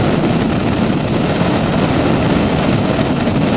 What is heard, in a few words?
loop, rckt